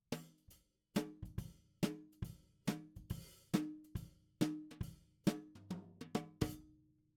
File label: SNR top